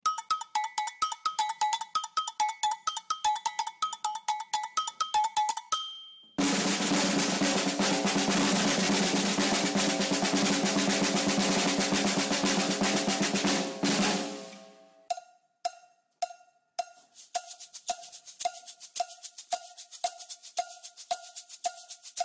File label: percussion